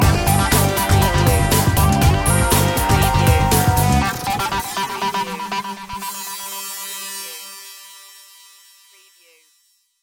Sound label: loop end